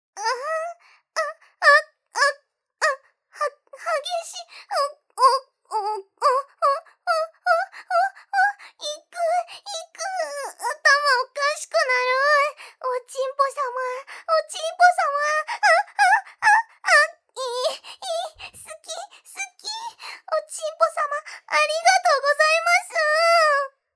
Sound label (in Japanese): ノーマルマイク あぁん♥あっ♥あっ♥あっ♥